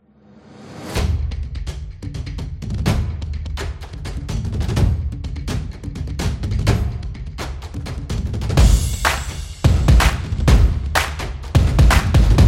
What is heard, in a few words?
Sport Beats